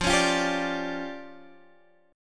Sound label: D Minor Major 7th Double Flat 5th, F